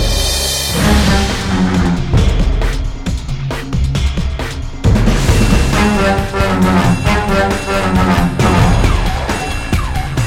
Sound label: fight music; Ben 10 Protector of Earth; Wii